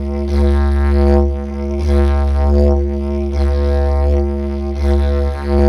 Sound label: PVC DIDG; Roland - LA Composer vol. 1